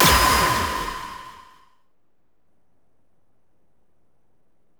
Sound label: SE GAME EXTAP; maimai